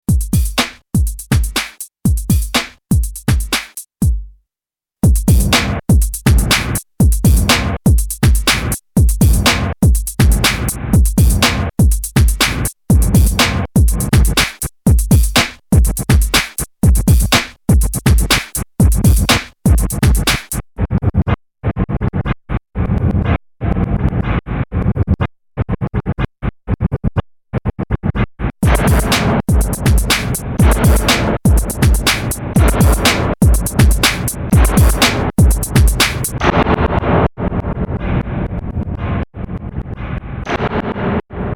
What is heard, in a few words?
retro reversed